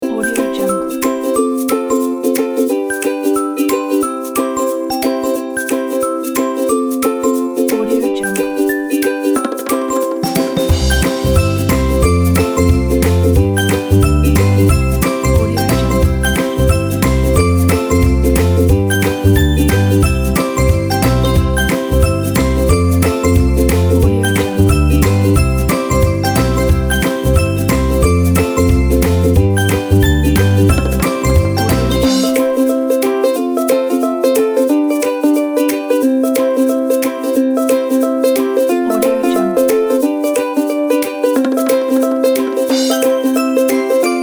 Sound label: happy